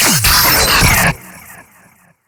Death; Warframe; Raptor; Battle Barks; en

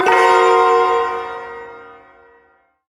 Shawzin Blues Chord; Blues Chord J; Warframe; Duviri Ersatz Shawzin; Chords

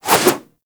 Generic Backflip Whoosh; Warframe; Characters

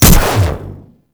Electro Mag Shot A, Corpus weapons; Warframe

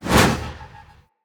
Shield Throw; Weapons; Swords; Dendrit Sword And Board

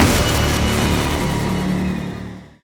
Fight Slow Walk End Stop; Warframe; Cinematics; New War; Mission Six; Ballas

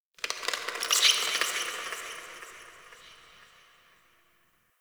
Forest Bats; Warframe; Grineer Forest

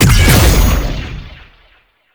unholy punch telegraph; Roathe; Warframe; Tau; Abilities

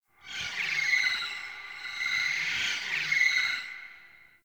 Distant Egg Cries; Warframe; Tau

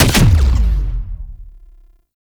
Alt Fire Punch; weapons; Scaldra AK

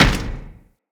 Moa Step Default, Footsteps

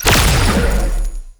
Shot Large; Weapons; Warframe; Duviri; Incarnon Adapters

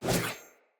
Sword Whoosh R to L; Weapons; Player; Swords; Fey Sword

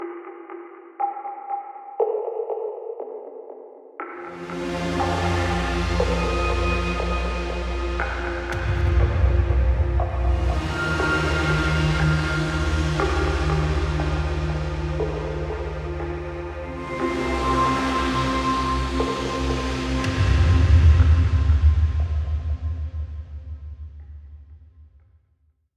Ambient Random; Railjack; Ambient One Offs

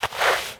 Crouch Move Foley; Warframe; Characters; Tenno

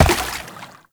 Step Water; Warframe; Uriel; Movement; Steps

